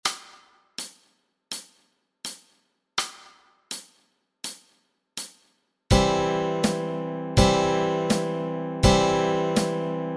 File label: Do Lord chords